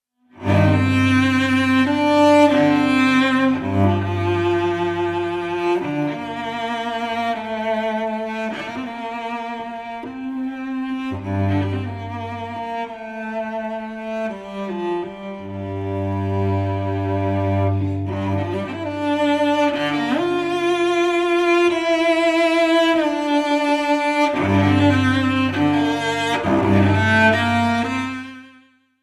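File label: lauda dolce for cello electric guitar and double bass; Gavin Bryars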